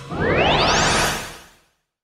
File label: sacred ball